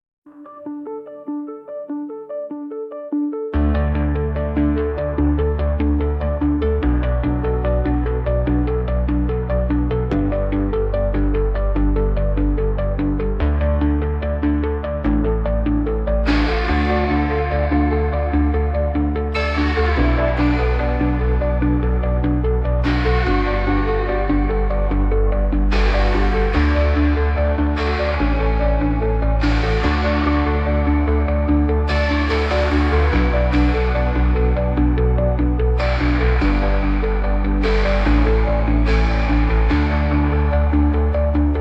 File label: Whale Song; instrumental; instrumental ai music